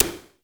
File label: TECHNO PERCS; Crypton - DrumBase5000